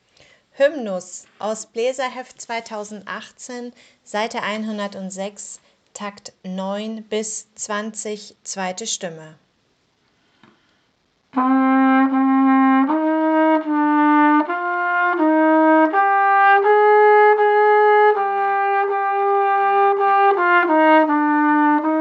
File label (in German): Hymnus Stimme 2, Posaunenchor; Takt 9 bis 20